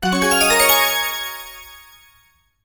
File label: level up; Dot Line